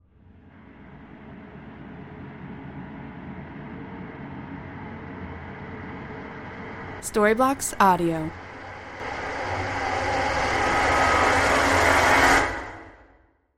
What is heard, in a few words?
crescendo airy dark reverse suspense build up